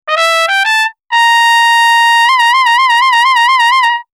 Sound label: shake, trumpet; samplemodeling